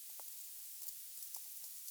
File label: source signal, recordings under ice